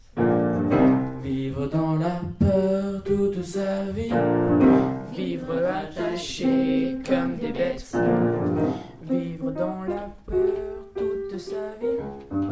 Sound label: jazz